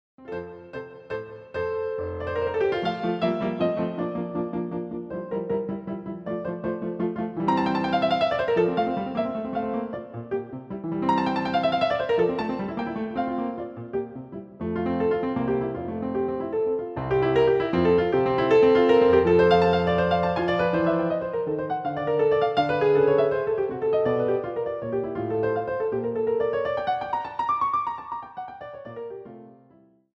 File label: Piano Sonata; No 2 in F K280 First Movement Allegro Assai